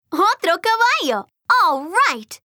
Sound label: horse; Dora and Friends - Legend of the Lost Horses